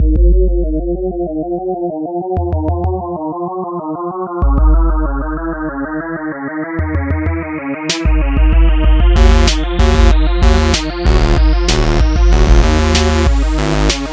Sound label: 808 Beat